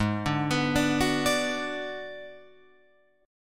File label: Ab Minor 7th Flat 5th Ab, down, Guitar, Open F; arpeggiated; JGuitar